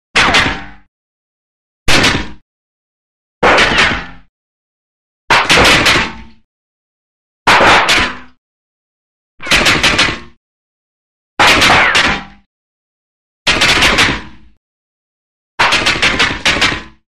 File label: Metal Ricochets Double Bullet Impact With Ricochet; Hollywood Edge Foley Sound Library